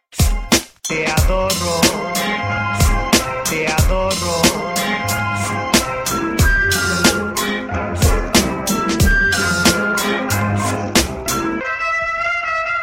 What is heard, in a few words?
latin beats; latino